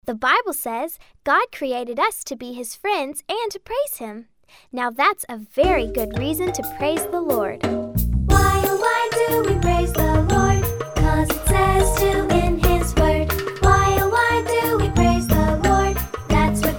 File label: Praise Songs; Preschool Tri-song Samplers